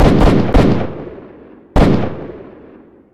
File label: shooting; Ambient; Zomboid; Random Sound Events; Stalker